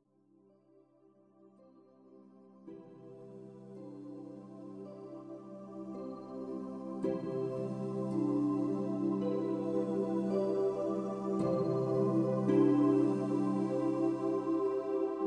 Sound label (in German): musik, ruhe